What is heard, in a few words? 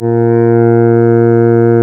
Synclav FM, WIND, A#; Big Fish Audio